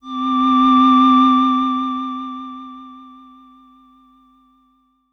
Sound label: SYNTH Romantic Moog